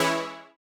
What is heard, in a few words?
Housey Stab E3; KG MegaSaur